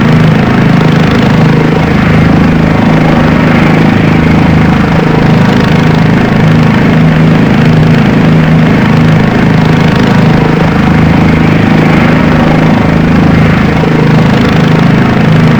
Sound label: ext, heli rah66; wac